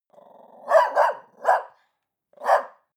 barking aggressiv loud, hunde; natur-tiere

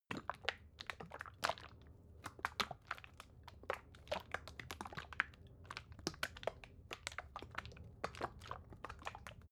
bottle syrup movement unsteady, gastronomie; berufe

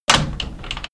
open dooring door

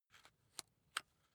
rock drill head remove soft; maschinen; bohrer; soundpool

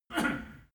male harrumph; menschen; koerpergeraeusche; soundpool

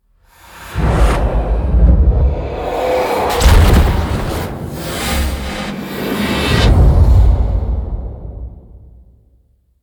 magic spell evil dark, horror

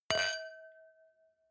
wine glass similar clink bounce; berufe; gastronomie; soundpool

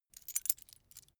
key ring mid size put down; objekte; schluessel

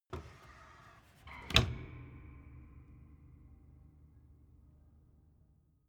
door flap loft close

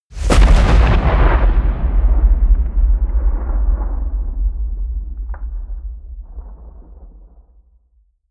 explosion; comedy-cartoon; soundpool

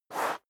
step sneaker sand slide short; schritte

